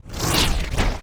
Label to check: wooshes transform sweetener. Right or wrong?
Right